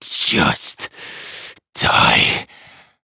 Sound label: Power Cast; Warframe; Entrati Lab Quest; 2023 TennoCon; Arthur